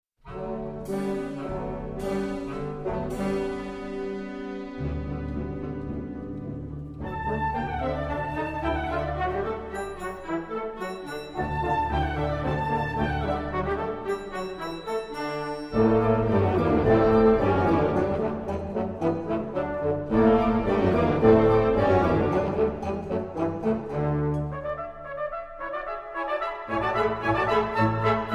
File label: piano; Bach Muzette